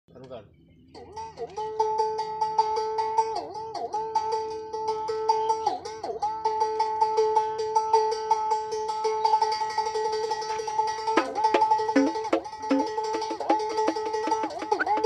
Ektara

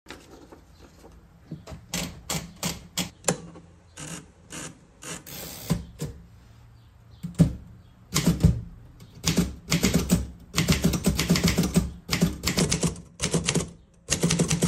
Typewriter

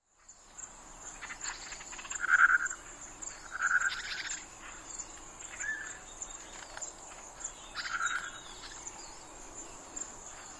Batis-mixta, پرنده سیه رخ جنگلی

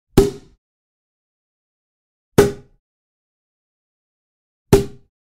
Cork; چوب پنبه

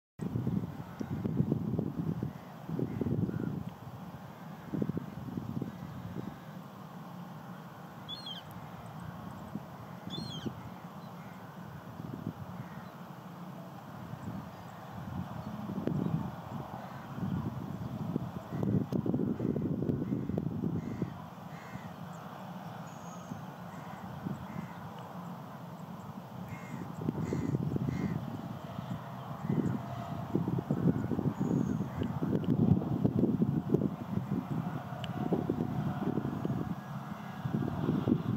marsh harrier

